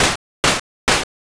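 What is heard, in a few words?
footsteps water walk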